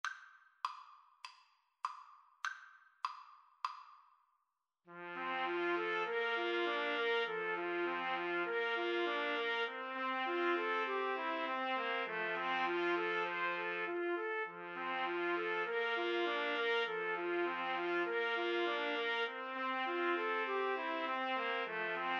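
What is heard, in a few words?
trumpet, karaoke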